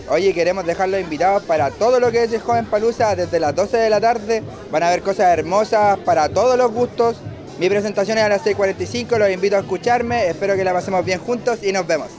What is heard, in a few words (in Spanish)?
cantante invitado